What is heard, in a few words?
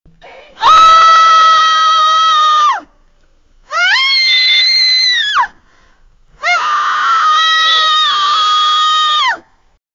old woman shouting loudly, frustration